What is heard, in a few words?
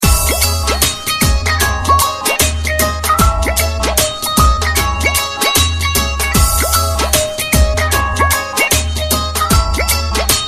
Desi Punjabi